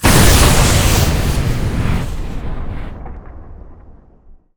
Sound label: space turret fire stereo; Quake 4 Alpha; weapons turret